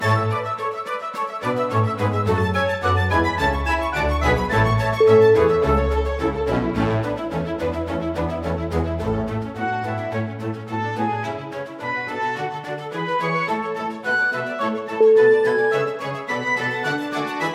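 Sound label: classical opening, music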